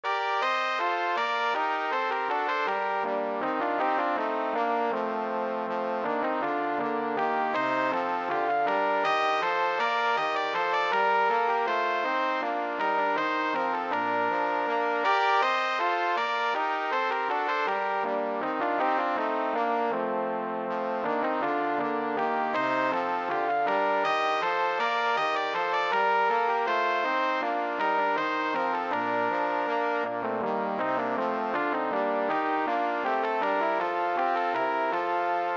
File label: bourree, 2 trumpets trombone